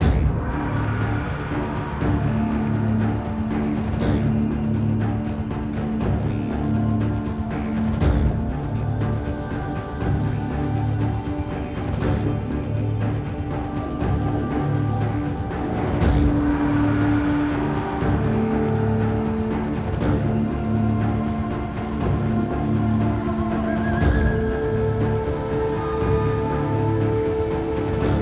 free spins bgr; amazon-city-of-gold

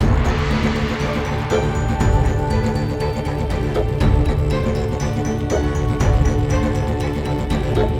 big win loop; amazon-city-of-gold

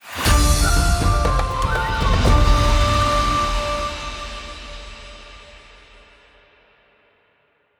bigwin intro; amazon-city-of-gold

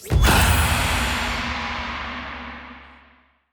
wild win; amazon-city-of-gold

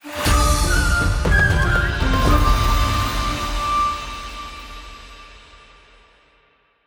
max win intro; amazon-city-of-gold

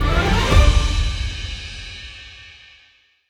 bonus intro popup; amazon-city-of-gold

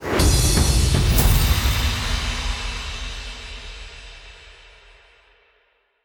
big win end; amazon-city-of-gold

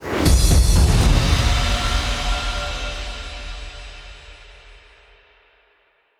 total win intro; amazon-city-of-gold

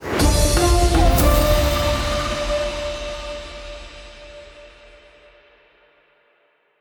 max win end; amazon-city-of-gold

